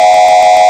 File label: horn, sirens